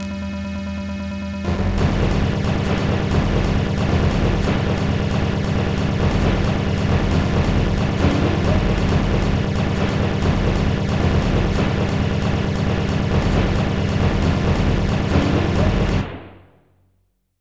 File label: smooth synths